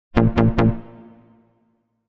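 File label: SMS electronic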